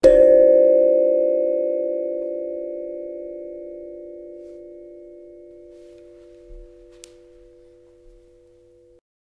Magic; Among the Sleep

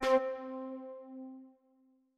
Stingers pendulum win

